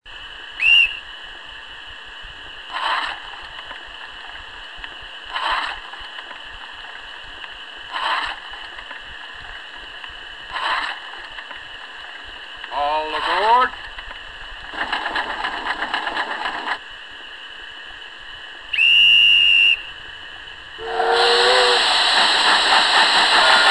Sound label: Shay Waldbahn